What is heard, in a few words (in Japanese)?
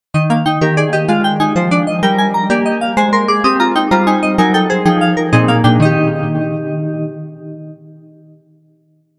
発車メロディ